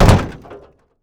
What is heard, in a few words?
land auto, footsteps